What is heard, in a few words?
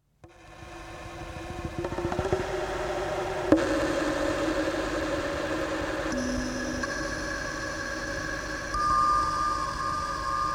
Magic Kalimba